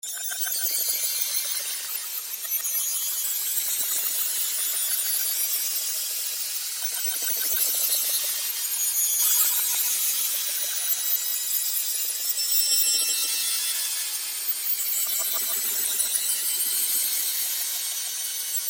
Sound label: atmo scifi crystal caverns